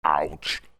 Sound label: PEOPLE